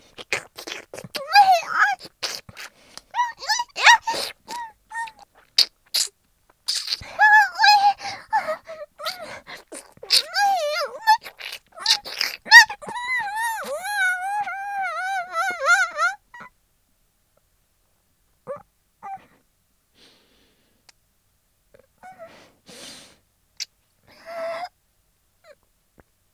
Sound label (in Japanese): フェラ; 音声ケモノ仕様！; フェラ増しスパイス！